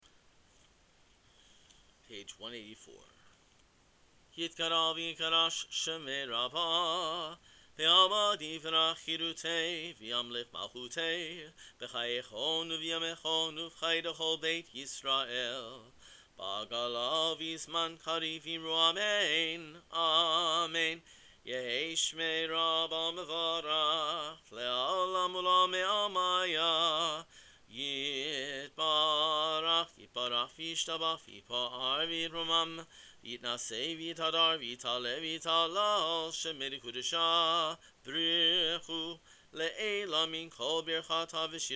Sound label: kaddish shalem; Cantor